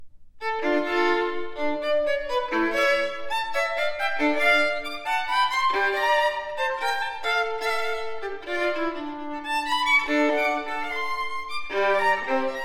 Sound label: courante; westhoff